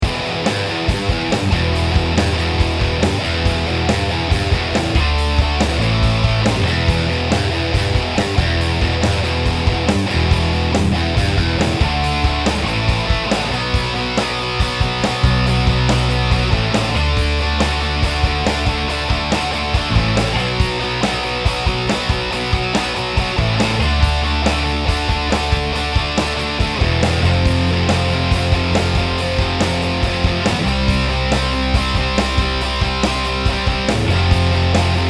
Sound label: Backing Track